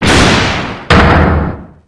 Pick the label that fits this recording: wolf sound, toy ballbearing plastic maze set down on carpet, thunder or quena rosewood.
thunder